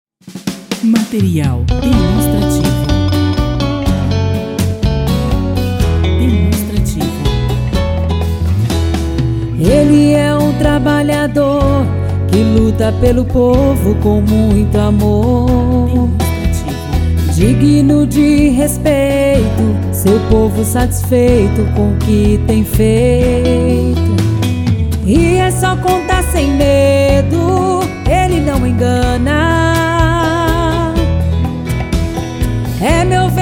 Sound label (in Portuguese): jingle politico voz feminina; Jingle Politico 2020